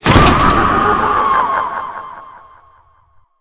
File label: Lights Turn On